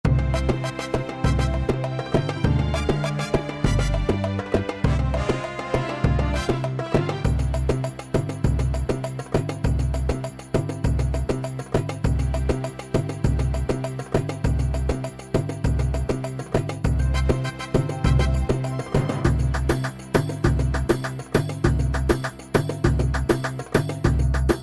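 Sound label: Malfouf C major, Middle East; gw-7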